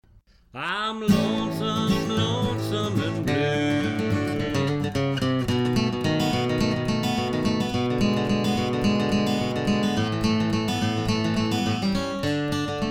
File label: Cross Picking Patterns, Guitar; Big Book of Crosspicking